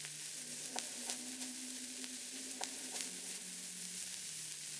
Cracklature, sparse soundscape

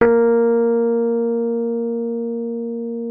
MKFF A#3, RHODES; Xsample VOL-1

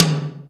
TOM, Drum Dry; East Collexion; Drum 1

